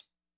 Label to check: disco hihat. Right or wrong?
Right